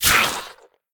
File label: swing; smite; god tiamat